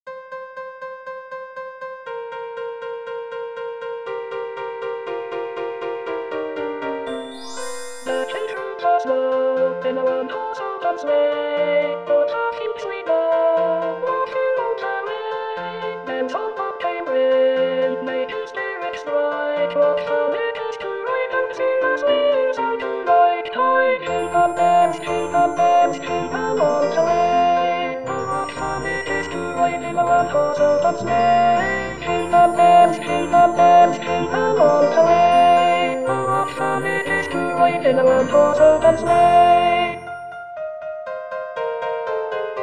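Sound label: Jingle bells Alto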